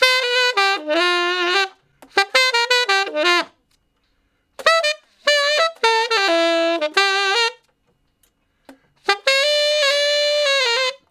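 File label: Tenor Sax Shuffle (G); Crimson Blue and Fabulous Horncraft 4 RnB; Horncraft for R&B; Solos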